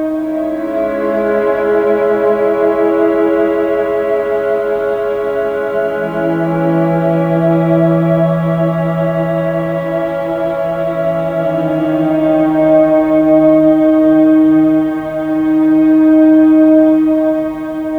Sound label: distant cellos loop